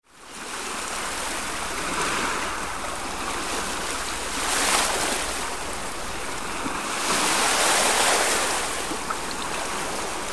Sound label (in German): meeresrauschen